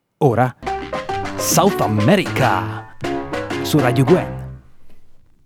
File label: Jingle; South America Selection